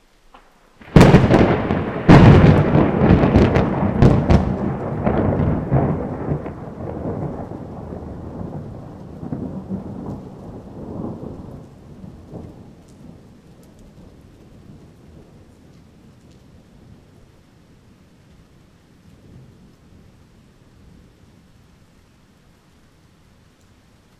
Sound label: Piezo crystal far; Materials; Barotraumatic